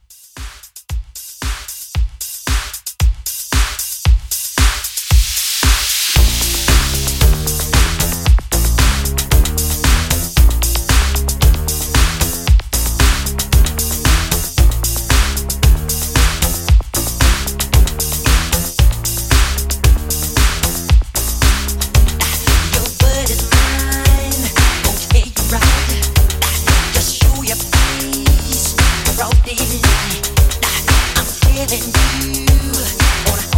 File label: 80s Pop Re Drum 114